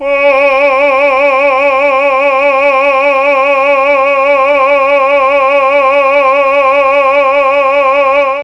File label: C#3 CHAMB MA, Mellotron, MALE VC; Mike Pinder - Mellotron Archive CD; CHMB MALE VC